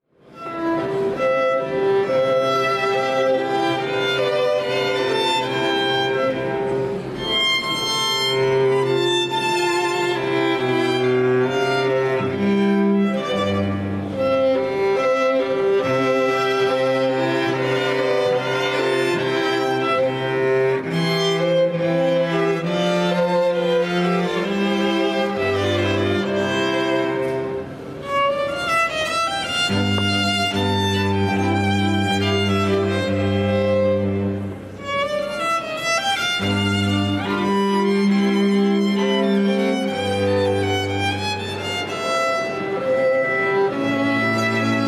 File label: romance; ensemble hubay; mozart